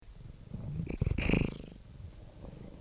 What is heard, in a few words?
Purr; Kitti